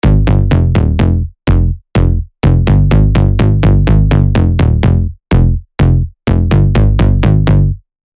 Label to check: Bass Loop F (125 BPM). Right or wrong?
Right